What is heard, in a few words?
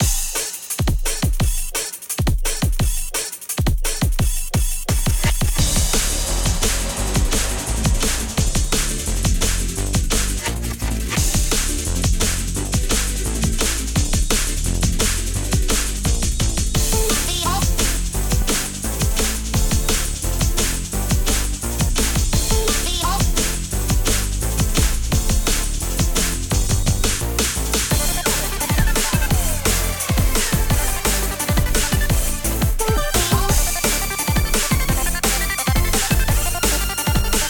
bgm; Yars Rising